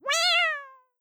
cat meow synth like a kitten

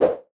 swish middle

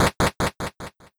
scene transition footstep lowbit fast

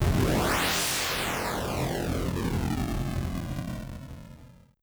jet pass lowbit

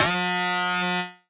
laser gun long; like salamander

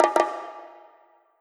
bongo drum high flam x2

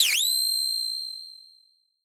flash

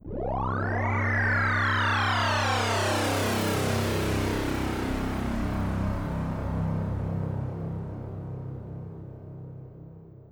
cosmic space middle

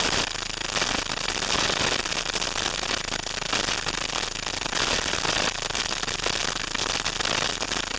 synth noise high loop

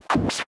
sword slash slow

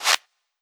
hand clap reverse

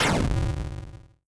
fireball magic lowbit

